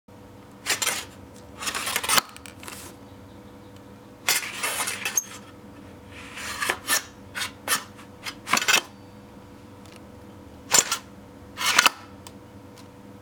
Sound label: Sheathing And Unsheathing Sword